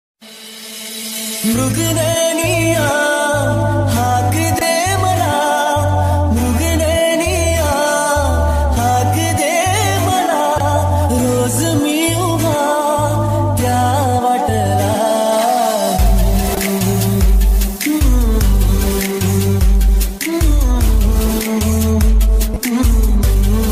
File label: Marathi Song Ringtone